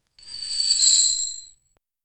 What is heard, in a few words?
pleasant crisp, airy